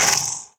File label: ice; kult